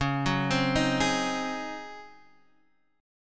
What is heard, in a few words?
Db Major 7th Suspended 2nd Suspended 4th Db down Guitar Open D